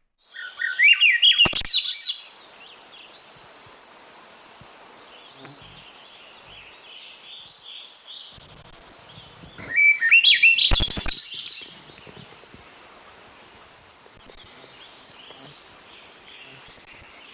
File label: Swainsons Thrush